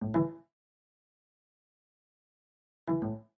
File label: windows 7 usb sound